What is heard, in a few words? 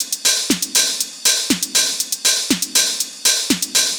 Combo Hat